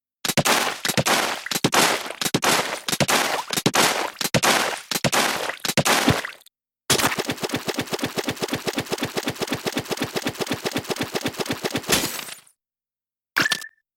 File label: SE- Splat Brella